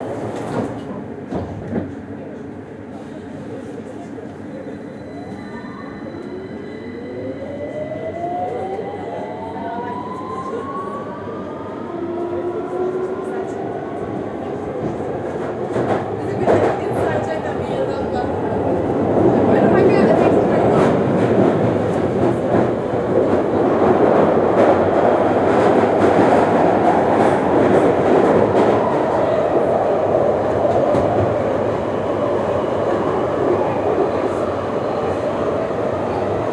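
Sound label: London Underground, Metro, Brake Squeal, Train Tracks; Jake Fielding; Train Onboards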